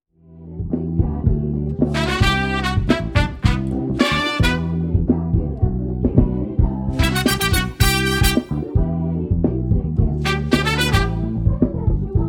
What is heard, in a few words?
Horns on Fire